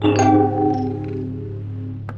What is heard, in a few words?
main outro